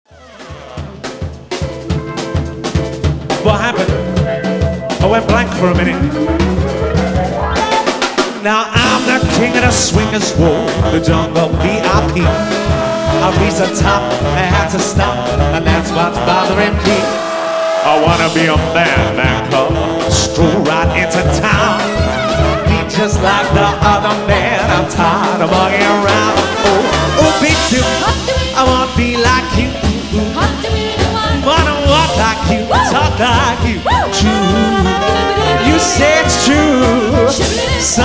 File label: Swing Band, Live